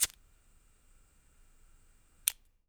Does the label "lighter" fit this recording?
Yes